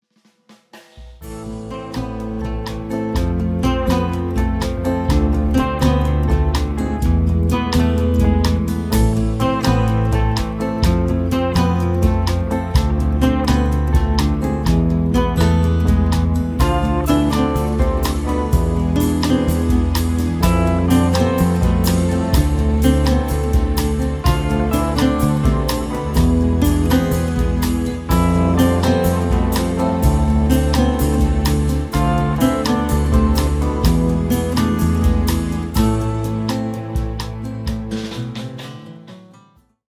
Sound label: West Texas Tango